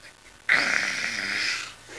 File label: roar